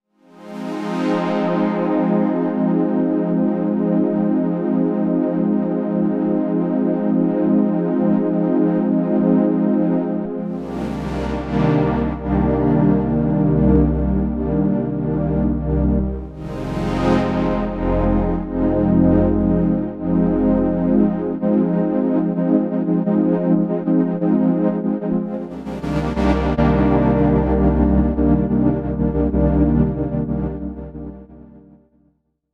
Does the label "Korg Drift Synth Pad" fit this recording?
Yes